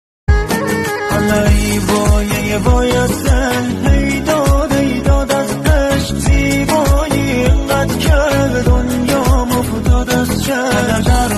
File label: SAX